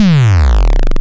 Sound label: Vector Kick, drum machine; 300 Drum Machines; DevSnd